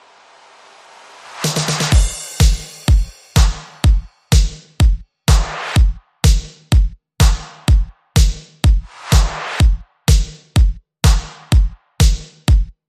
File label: Drums